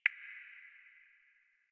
Computer Room Beep; Warframe; Ambience; Nineteen Ninety Nine

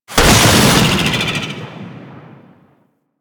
Boost Thruster; Warframe; Vehicles; Archwing Demolition

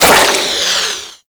Proto Infested Mutalist Big Jump Flesh; Warframe; Abilities

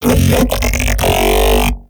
Mech Ambient Vocal; Nechro Mech

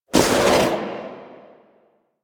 Speargun Throw Windup Foley; Warframe

